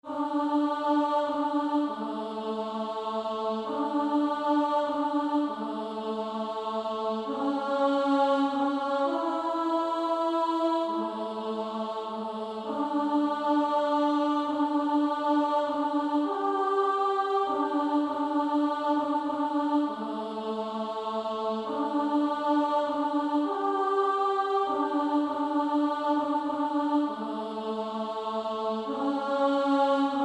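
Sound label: practice music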